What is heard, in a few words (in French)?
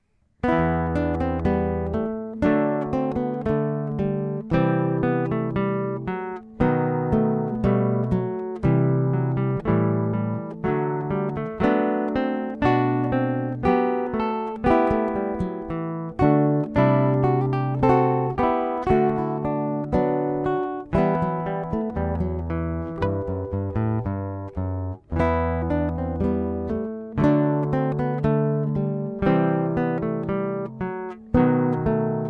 etudes pour quatuor guitares